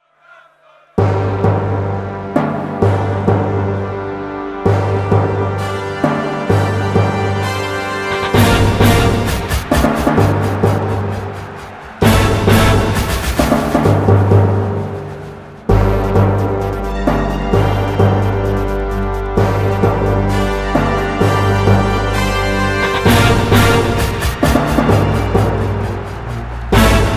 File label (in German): Stadion Jingle; Werder Bremen